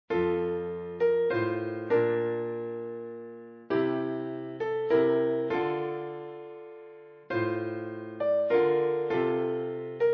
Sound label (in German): Stille Nacht heilige Nacht